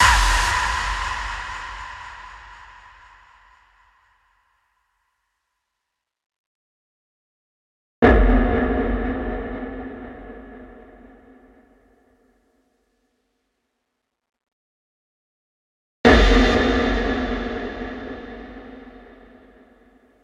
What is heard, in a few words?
Death whistle; Inmotionaudio; Freesamplesunday